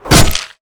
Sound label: hammer hit; weapons